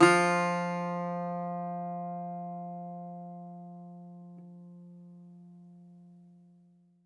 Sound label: Greek Bazouki, plucked strings